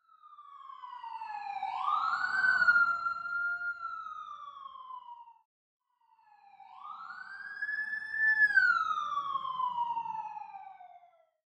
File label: Police Car Departs